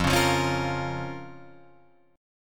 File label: F Suspended 4th Sharp 5th F, Guitar Open F; Fsus4#5; strummed